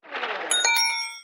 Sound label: incoming